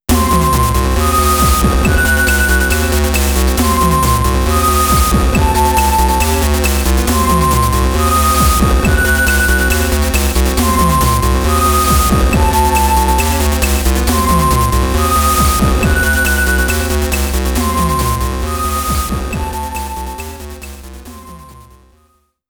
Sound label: Dungeon; rockman exe sound box